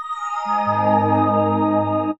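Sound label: NICE arpeggio, SYNTH FX; AMG - Vince Clarke Lucky Bastard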